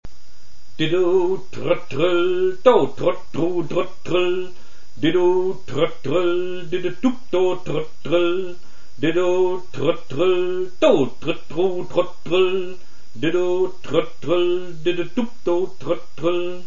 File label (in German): Rhytmus gesungen